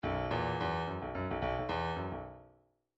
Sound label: bdf midi file grooves funk t funk t